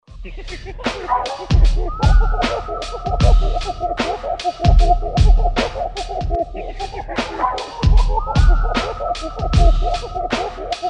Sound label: 76 Dog Catchr; Roland SR-JV80-12 Hip Hop Collection